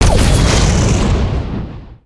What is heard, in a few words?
Rocket Impact